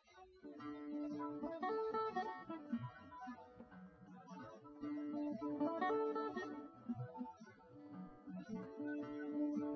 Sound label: nodrums live rec; SiSEC08; SiSEC underdetermined